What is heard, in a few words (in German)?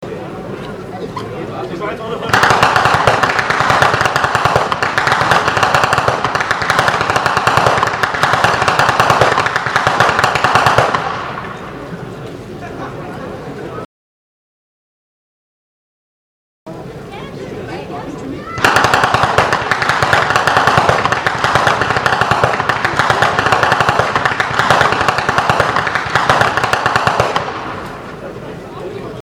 schnalzen; siezenheim